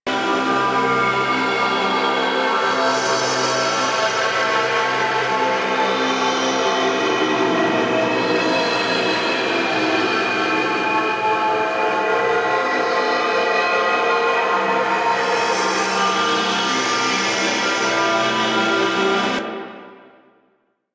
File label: Puzzle Beacon Loop; Warframe; Duviri; Bombastine Encounter